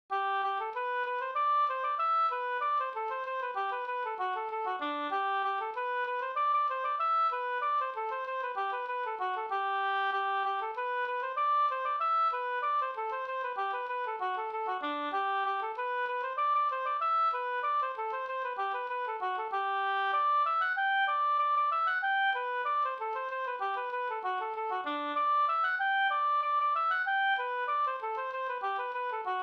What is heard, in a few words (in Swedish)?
engelska; f tre par